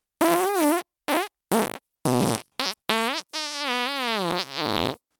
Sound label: fart; vegan